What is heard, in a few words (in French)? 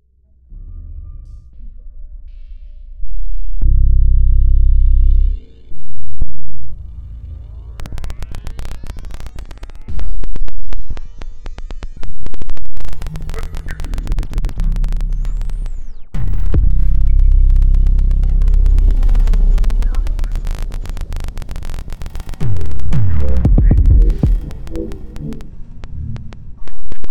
paysage sonore constant